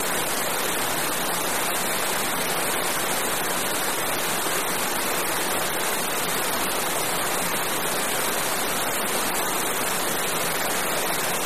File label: River Rapids Waterfa, rivers